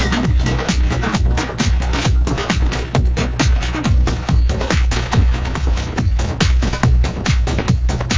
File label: music gen